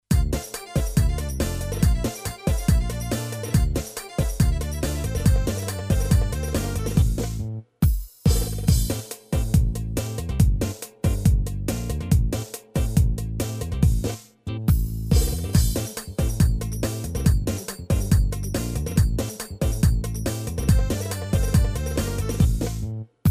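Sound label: modern 4-4, yamaha psr a300tr v2 ritm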